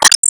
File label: Foot Woman Carpet; Disney Star Smash; Android, iOS, Mobile